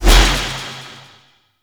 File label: Alt Throw; Warframe; Corpus; Spear Gun; BE Ferrox